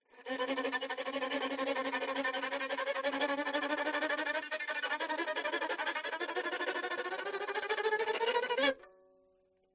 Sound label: violin tremelo dynamic; aston stealth